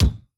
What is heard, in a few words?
Kick, Yamaha RY20